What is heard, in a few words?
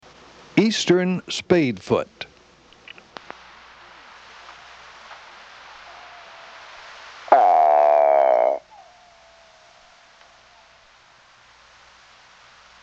Eastern spadefoot (Scaphiopus holbrooki), frogs; Family Pelobatidae - Spadefoots